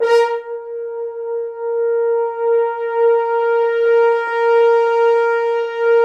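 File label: french horns dry; Roland L-CDX-03 Disk 2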